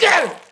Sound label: frost alf male attack; DAoC